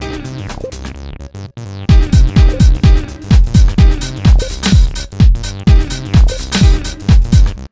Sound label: Fresh Disco House, 127 BEAT; USB Soundscan vol.20; AKAI